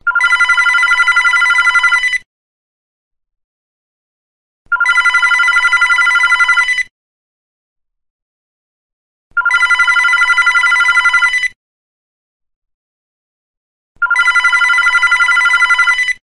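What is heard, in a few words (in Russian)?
звук звонка стационарного телефона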